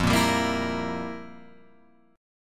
F Major 7th Suspended 2nd Suspended 4th, F, down, Guitar, Open F; FM7sus2sus4 strummed; JGuitar